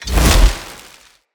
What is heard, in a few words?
Casting Device Cast Heavy; Weapons; Player